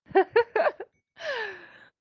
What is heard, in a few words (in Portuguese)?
RISADINHAAAA